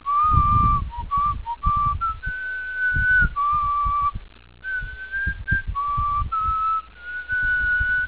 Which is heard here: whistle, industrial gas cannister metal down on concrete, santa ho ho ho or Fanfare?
whistle